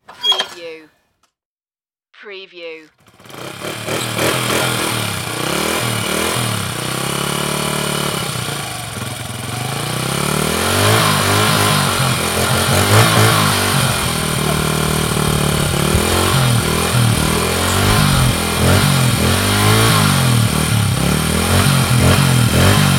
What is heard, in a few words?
Dirt Bike Kick Stand